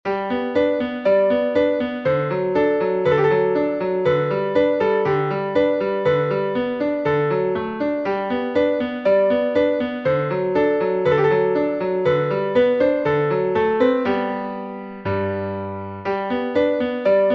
arietta major; clementi muzio

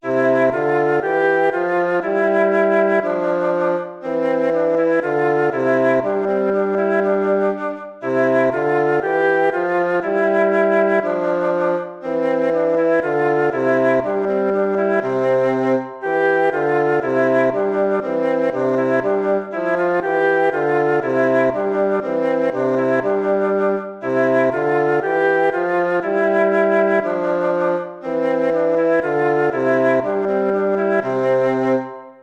gavotta; hook james

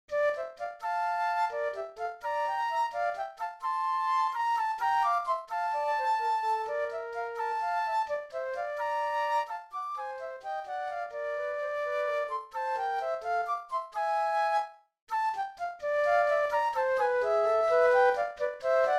bagatelle for 2 flutes

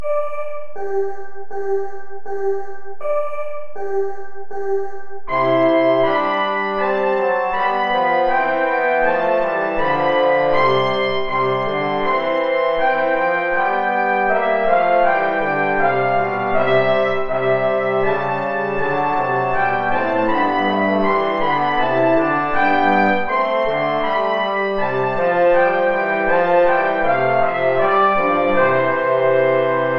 play along without trumpet

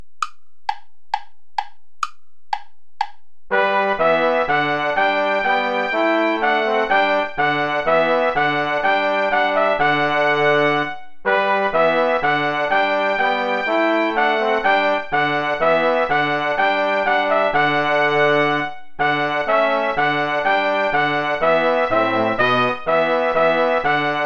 play along without trumpet; schutz heinrich wohl denen die wandeln

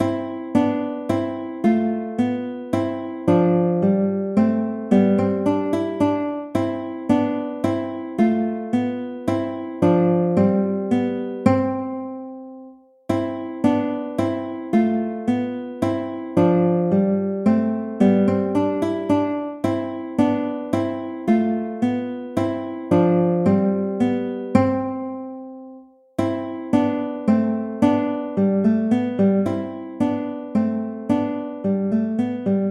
tempo minuetto; hook james

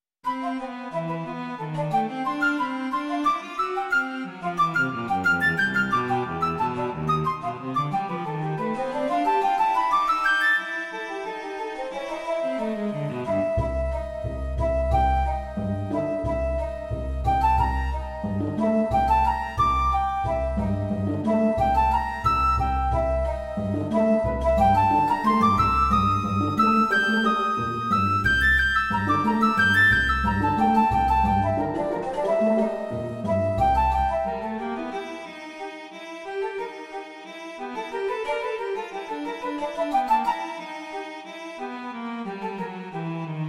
divertimento for flute and violoncello capriccio

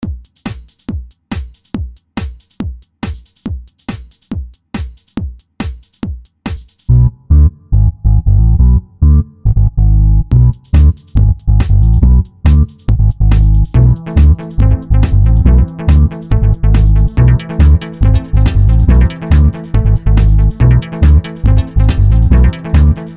beat house

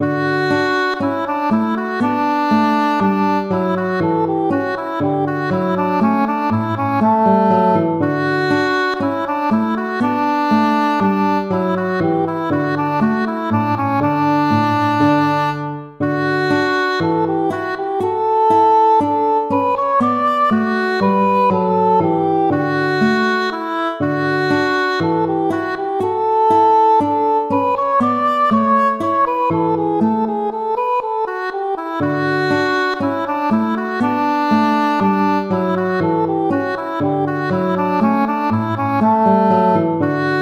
allegro; hook james

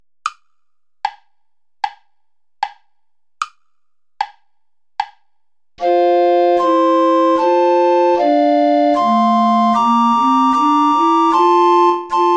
play along without tenor recorder; bach johann sebastian

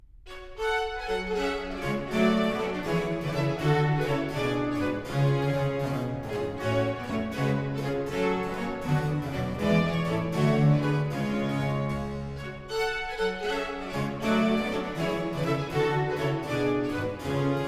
jig

